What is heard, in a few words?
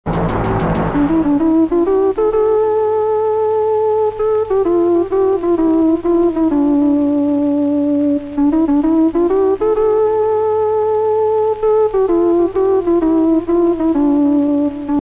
punnagai mannan bgm